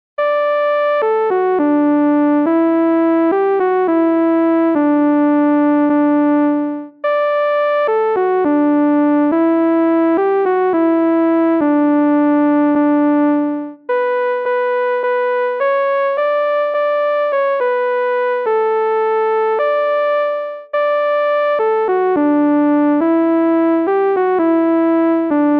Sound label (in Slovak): verbunk